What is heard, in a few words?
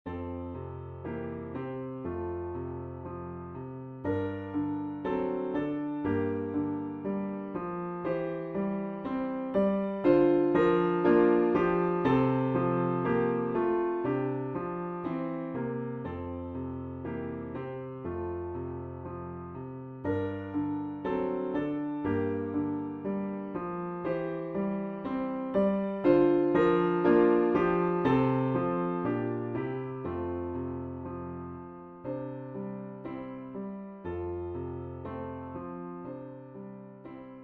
Lullaby